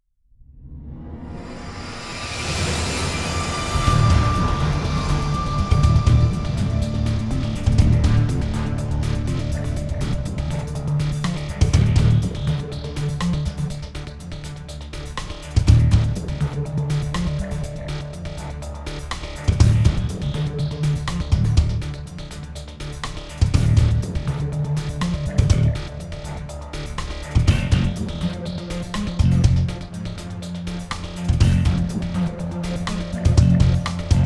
Music Low Tension Inspriational No Guitars No Horns; starcraft 2 wings of liberty; MissionMusic